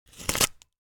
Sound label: Scratch Wood